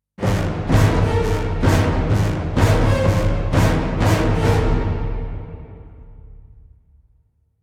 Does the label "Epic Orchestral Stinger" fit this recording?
Yes